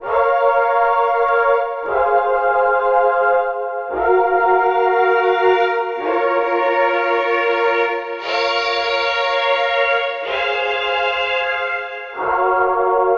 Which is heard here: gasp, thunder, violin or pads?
pads